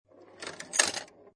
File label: Pinball Sound Ball Roll Past Thin Gate Or Lever